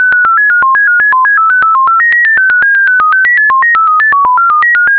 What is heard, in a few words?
mfsk8